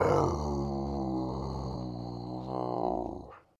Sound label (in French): Grognement